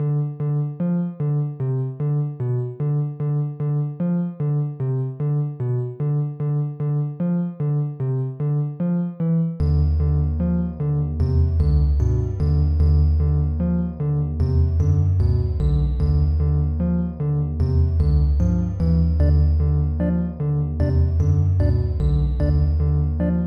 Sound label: The Beeping Song